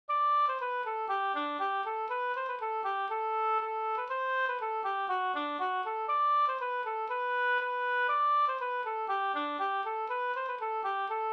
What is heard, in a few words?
polka; Vestermarkarn Aland